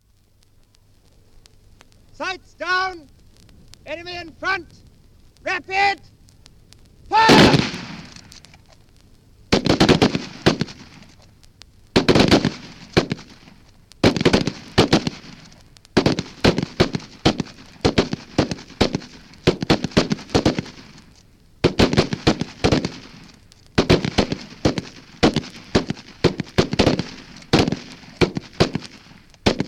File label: Rapid rifle fire (from 78 disc); Rifles (Sound Effects:Guns); BBC